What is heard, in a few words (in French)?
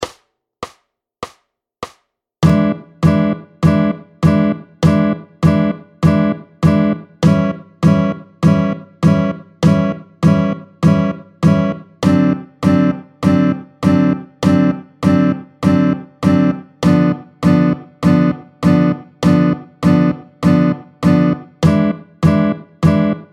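tempo 100 rythm changes A, Rythm changes A, tempo 100, guitare; 18-07 Rythm changes A, tempo 100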